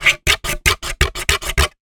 plastic thin phone cover scratch with knife; foley; zapsplat

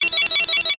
science fiction computer data burst

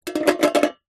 foley tin set down concrete wobble; zapsplat

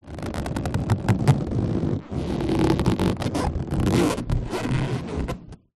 balloon inflated handling squeaks; foley

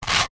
stone small scrape on concrete paving slab; foley

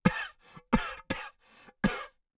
human coughing male through snorkel

